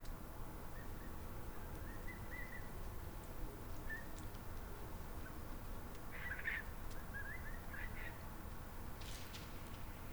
chatter quip

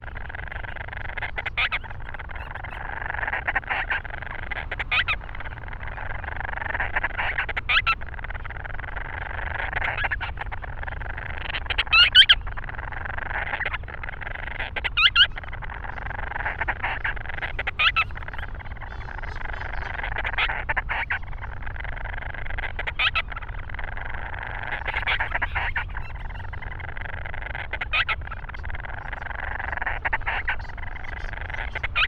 Grants Storm Petrel

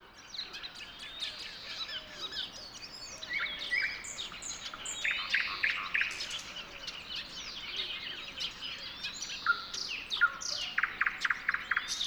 Song With Dawn Chorus

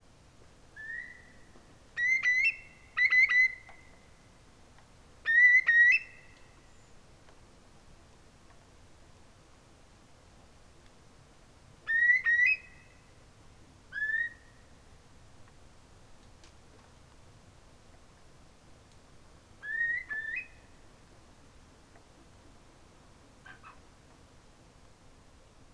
Curlew; Lytchett Matravers

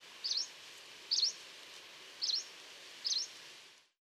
obscuratus call

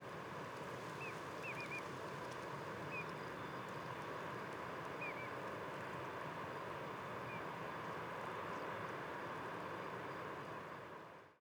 whimbrel like

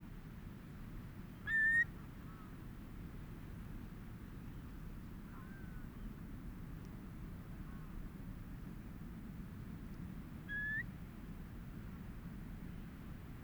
long low

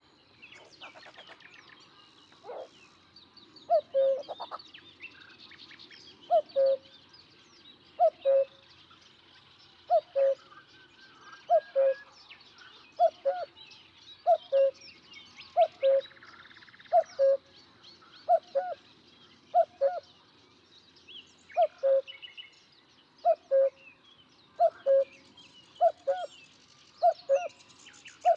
reedbed song

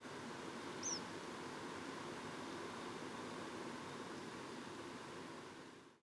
nfc rtp like

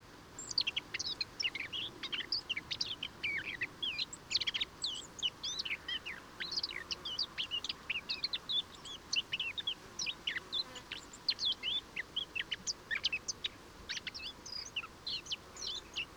subsong

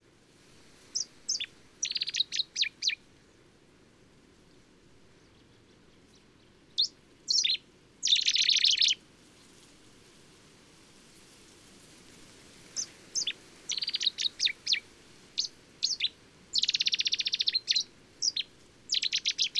dispute

